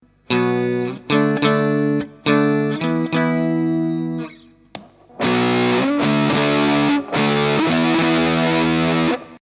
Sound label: Distortion Pedal Demo